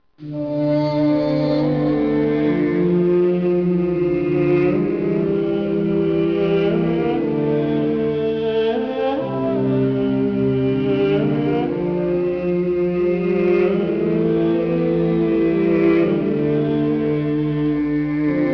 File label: windows start